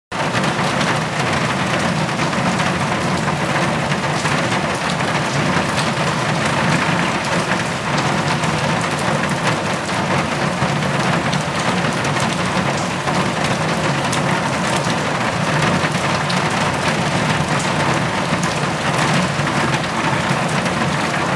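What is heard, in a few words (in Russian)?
по металлической крыше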